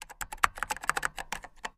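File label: computer keystrokes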